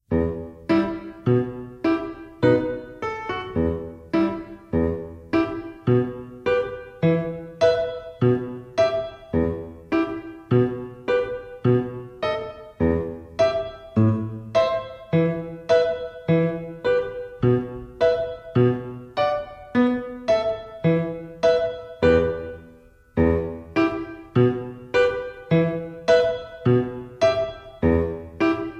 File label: Gypsy Dance Accompaniment; Gypsy Dance piano only track (test); 6th Grade - Beginner Orchestra